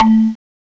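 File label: MARIMBA BLOCK, MALLETS; MARBLOCK; CMI Sound Library Rev 1.3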